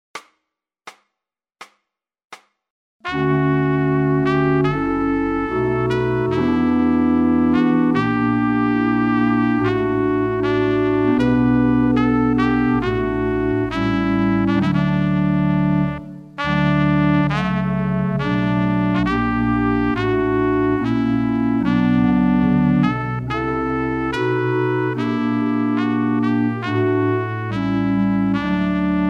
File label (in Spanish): D solemne